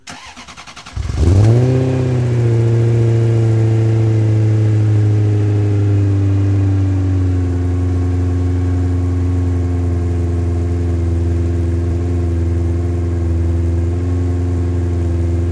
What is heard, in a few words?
Ferrari 355 Start Loop, Automobiles